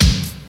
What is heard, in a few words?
Old School Deep Snare Drum Sample C Key, steel snare drum tuned to the C note